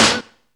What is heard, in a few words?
GRUNGE, SNARES; Zero-G; Funky Elements